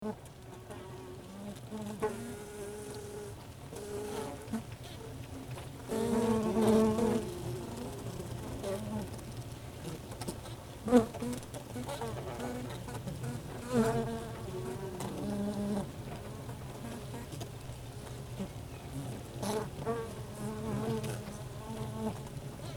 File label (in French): Ruche Interieur